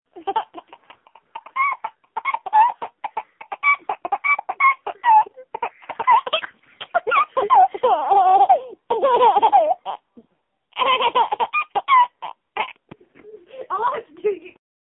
laughing

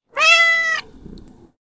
CAT MEOW SOUND

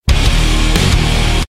heavy guitar intro; ringtones; rock

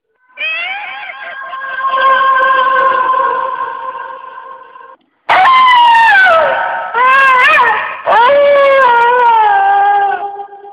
horror pet; animals

